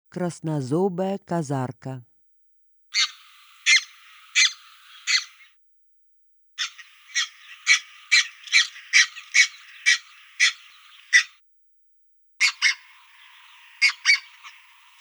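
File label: red-breasted goose voice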